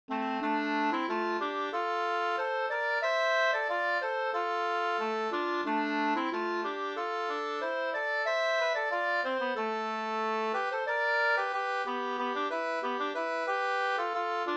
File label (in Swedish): ganglat; Myrslattern Hj utl Lasse Sorlin